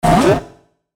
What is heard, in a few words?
Hit Descend, Hit Descend Fast; Descending; Descends; Blastwave